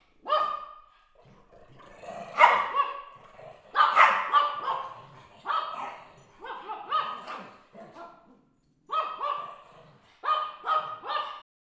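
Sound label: dogs growling; rychaniya sobak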